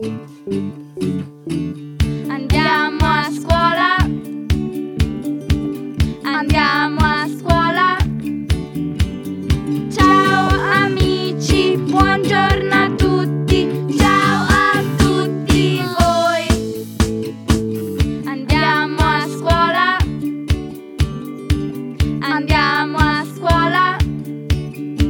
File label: song italian